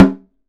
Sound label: Snare Drum; Percussion Samples